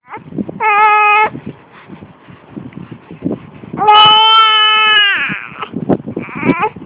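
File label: baby hungry, male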